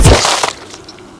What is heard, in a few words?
flesh